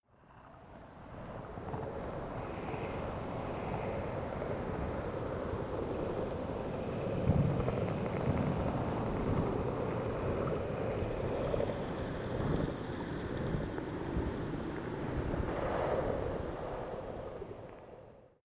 water fx windy

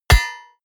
hit metal hammer medium

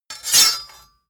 sword cut hard light

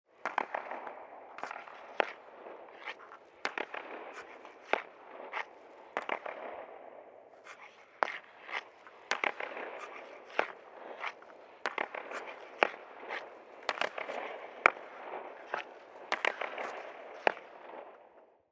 pass shots

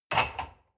dishwasher cup place soft

